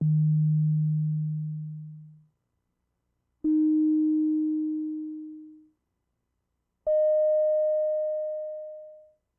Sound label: C Note Sines Dry; Loki